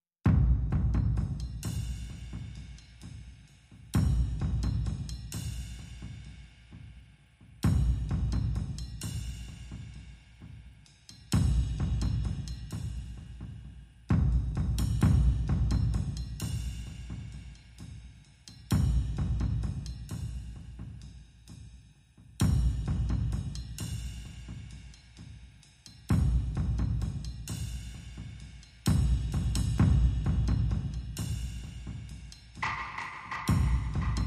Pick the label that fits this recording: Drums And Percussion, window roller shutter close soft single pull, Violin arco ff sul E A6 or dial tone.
Drums And Percussion